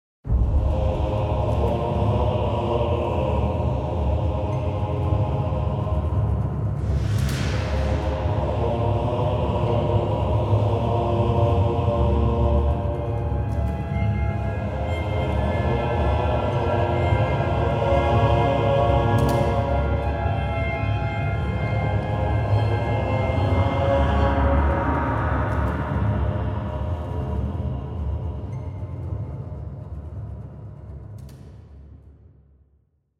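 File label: Monastery Variant Creepy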